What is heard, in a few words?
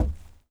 FOOTSTEP Trainers Wood Boards Walk mono; Phasmophobia